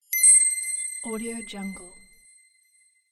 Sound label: gold shine